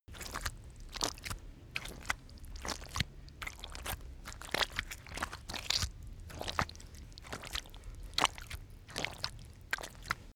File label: step mud walk; schritte